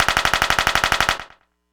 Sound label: fire rifle